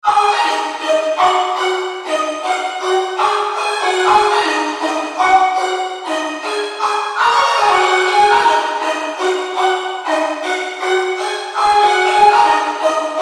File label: Lead